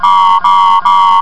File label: alarm; valve; blackhawk